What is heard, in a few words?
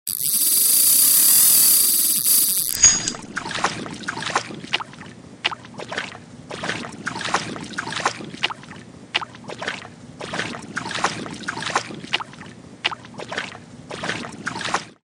line jerk